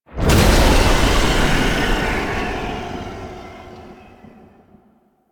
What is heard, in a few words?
Rune Despawn; Warframe; Entrati; Mirror Defense